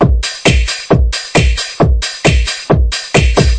134 bpm, warehouse party, drum loops and breaks

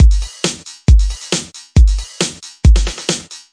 136 bpm, pimpbot, drum loops and breaks

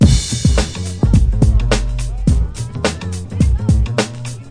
106 bass, drum loops and breaks

